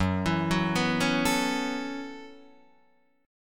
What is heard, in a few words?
Gb Minor 11th Gb Guitar Open D; 4,7,4,5,4,7; down; chord shape